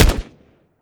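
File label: akilo47 fire player, weapons; viper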